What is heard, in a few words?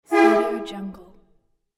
train horn